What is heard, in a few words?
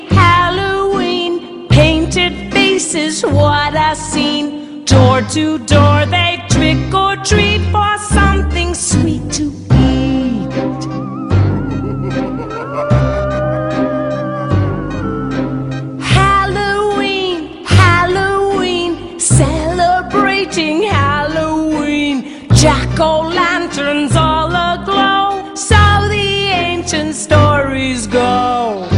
halloween songs